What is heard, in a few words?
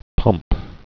pump